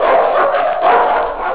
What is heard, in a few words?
animal dogs barking